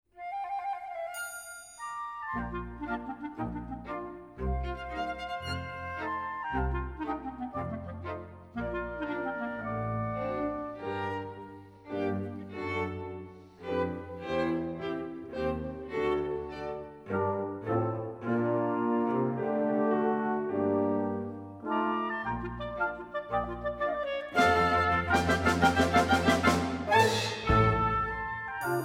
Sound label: instrumental